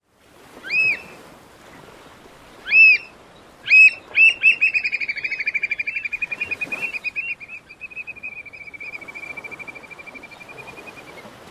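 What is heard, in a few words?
American Oystercatcher display song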